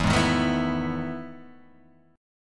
E Major 11th E down; EM11 strummed; JGuitar

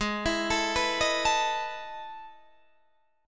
E Major 11th chord

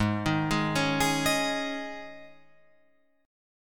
Ab Suspended 4th, Guitar Open F; arpeggiated; Absus4; 8,10,8,8,11,10

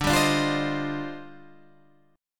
D sharp Major 13th, D, guitar chord

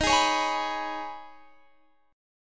Eb 7th Suspended 4th Sharp 5th Eb, Guitar Open G; x,20,18,18,21,21; down

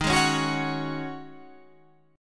Ab 7th Suspended 4th Eb

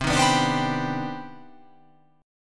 C Minor Major 9th C

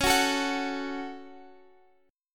Db Suspended 4th Sharp 5th Db, Guitar, Open F; strummed; x,x,13,13,12,13

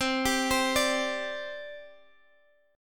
C Suspended 2nd C Guitar Half Step Down

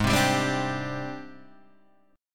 G sharp Major Sharp 11th, Guitar Half Step Down

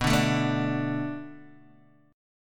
B Minor 7th B Guitar DADGAD, chord

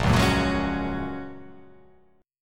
A sharp Minor 7th Sharp 5th, down

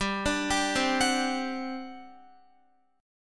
G Major 7th Suspended 4th G down Guitar; GM7sus4; arpeggiated

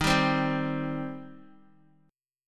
Eb 6th Eb, Guitar; strummed; x,6,7,5,7,x; 4F,4A,5C,5G,5C,6E

